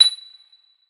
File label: Chime